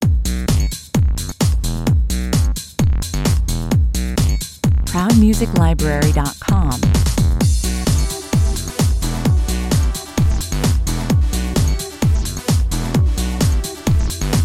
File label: (instrumental) 130 bpm